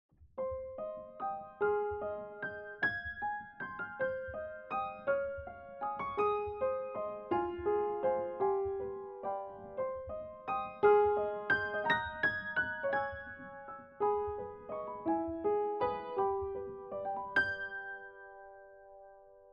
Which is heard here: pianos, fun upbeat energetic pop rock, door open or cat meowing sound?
pianos